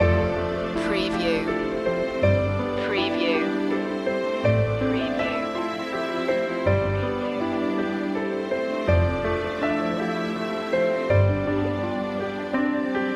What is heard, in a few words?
fantasy waltz loop